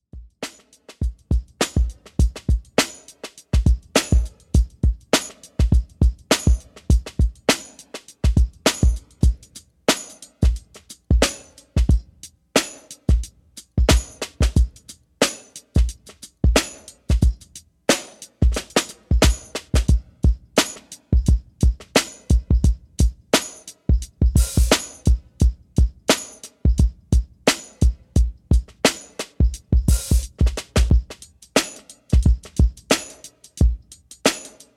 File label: funky soul drums; patchbanks